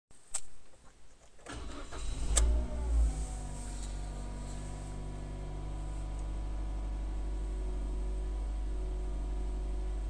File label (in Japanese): もっとも心地よいエンジン音